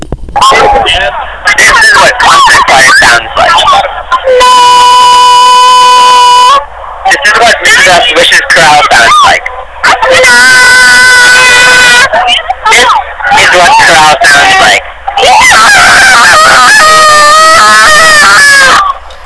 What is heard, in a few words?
choral concert choir